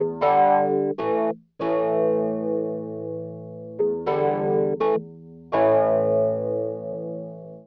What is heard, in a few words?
organ house 125 A; eJay; Keys